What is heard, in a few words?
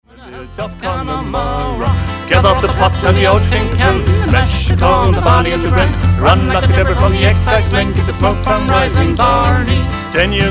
strings spoons; in the hills of connemara